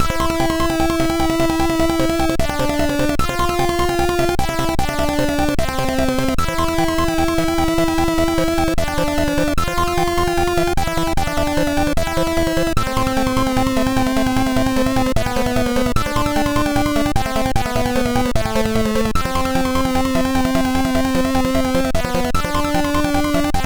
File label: Retro Reto Pan, MOS6581R4; Baron Ashler